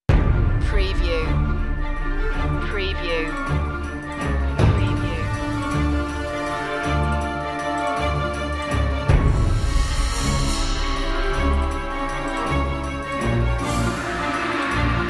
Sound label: no choir version